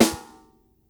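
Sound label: snare drum, acoustic drum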